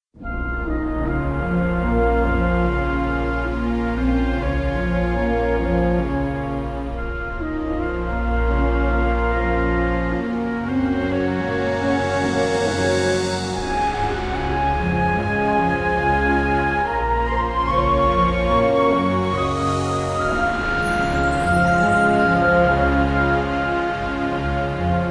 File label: bgm map background; devils demons android game music